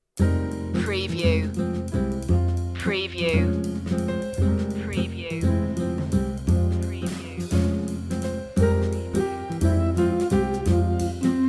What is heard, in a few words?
rainy day waltz